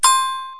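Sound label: alert sound; National Museum of American Art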